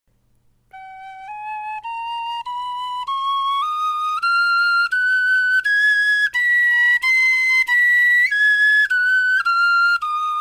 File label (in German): tonleiter